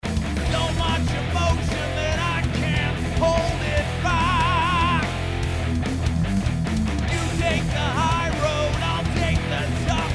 Sample Hard Rock Before

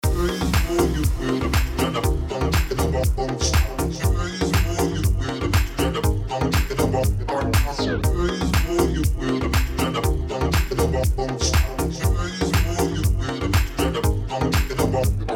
Electronic

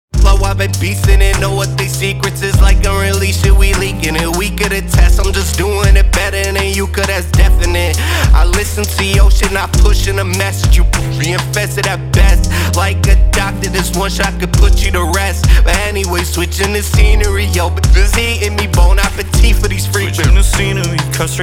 Hip Hop Mastered

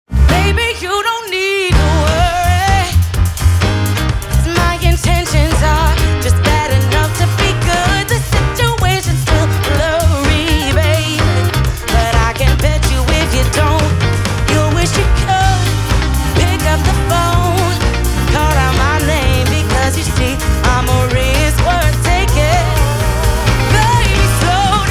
Sample RnB Mastered

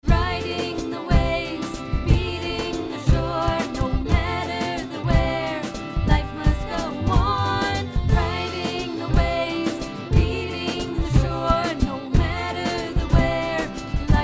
Sample Country Before

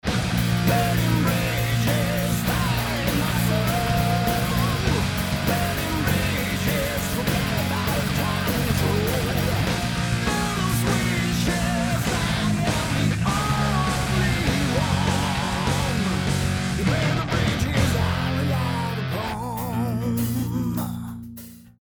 Metal Before

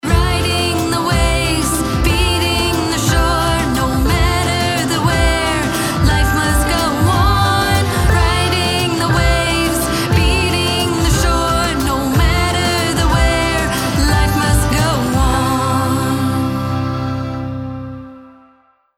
Sample Country Mastered

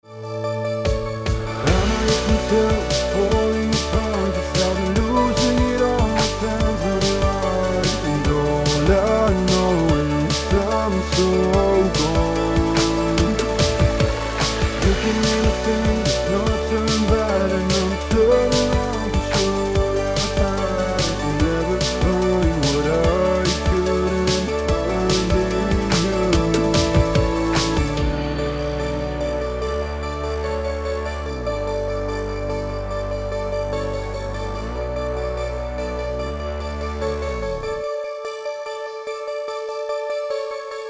Sample Pop Before

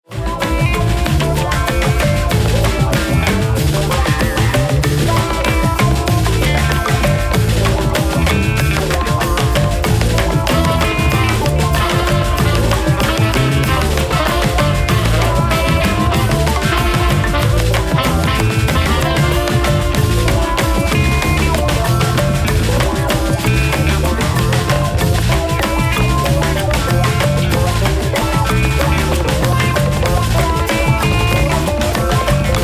Sample Folk Mastered

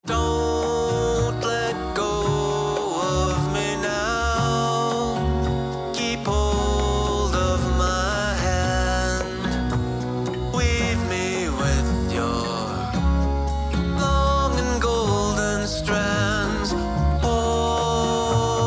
Acoustic Mixed

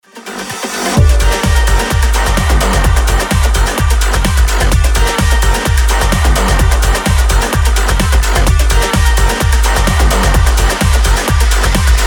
Sample House Mastered

